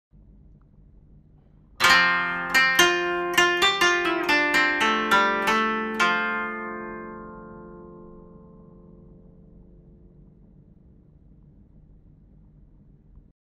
koto

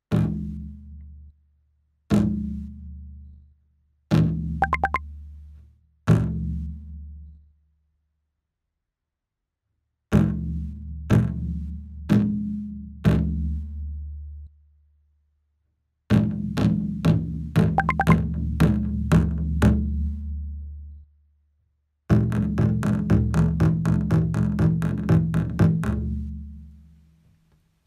taiko

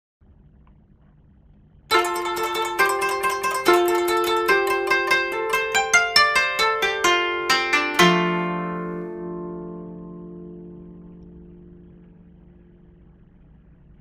koto

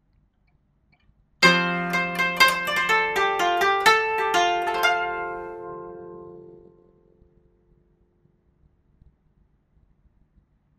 koto

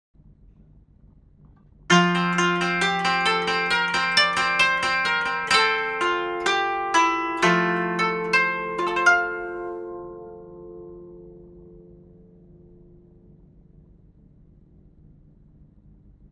koto

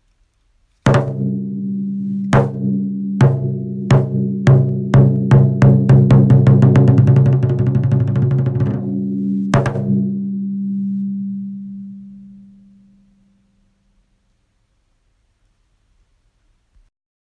taiko

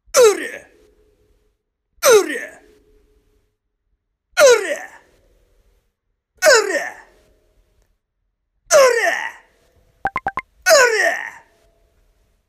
voice uurya

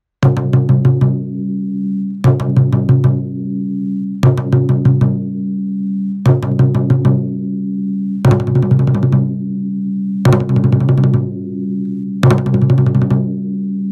taiko

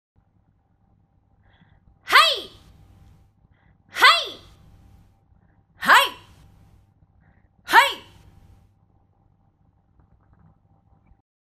voice hi

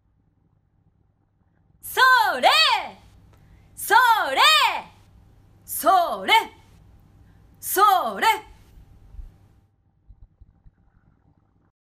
voice sooore

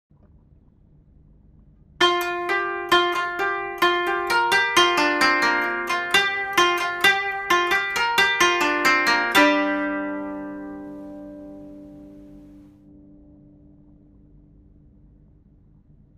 koto

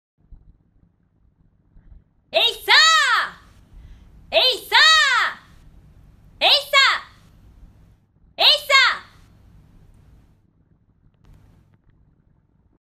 voice eisa

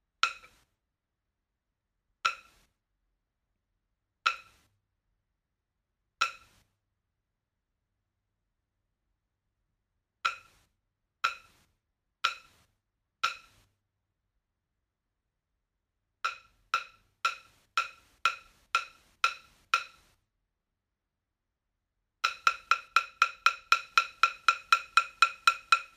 taiko bachi

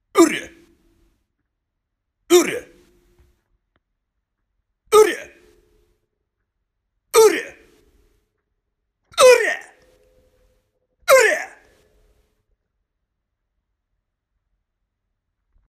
voice urya

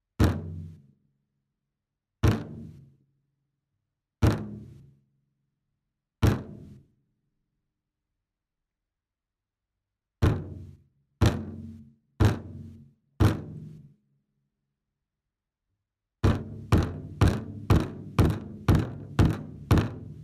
taiko

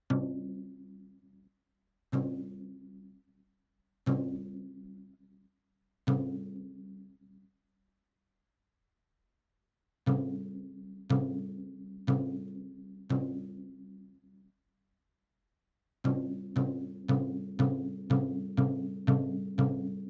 taiko rim

